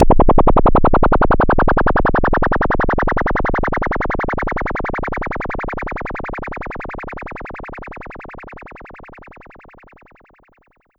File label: Pollard Syndrum